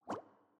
Bubble Small Blow

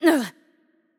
Hurt Light; VO; bloom minigame

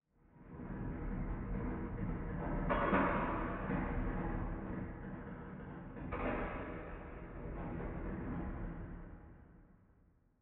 Distant Metal Creak

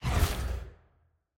UI Menu Incomplete Appear

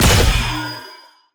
Attack Jump Heavy Pre; bloom minigame

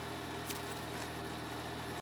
Light Box Static

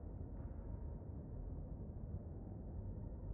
Ambience Zaun Street

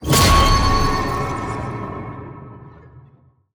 Enemy Boss Spawn Shield; bloom minigame

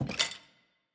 Footstep Plates

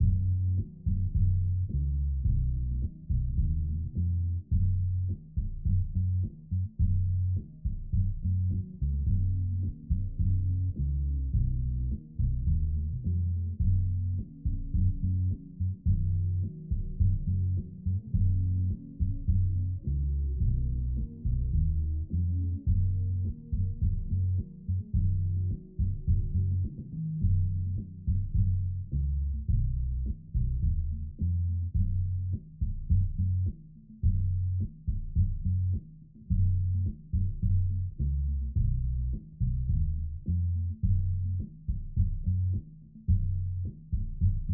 Music Track Basement Loop

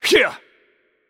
Attack Heavy; VO; bloom minigame

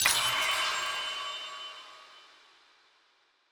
UI Click Spirit; bloom minigame

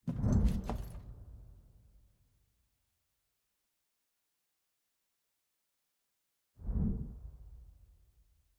Turret Enter First Time

